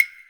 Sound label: Wood, Block Stick; Equipped Music; Loungin House